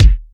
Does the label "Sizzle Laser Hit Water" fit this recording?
No